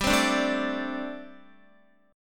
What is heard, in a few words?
G Suspended 2nd Suspended 4th, Guitar; Gsus2sus4; strummed